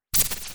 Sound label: Electric spatter